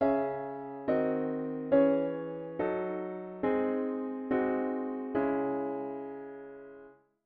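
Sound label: diatonic inverted V7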